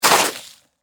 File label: Snow Ice Stab; Weather; Sound Effects:Weather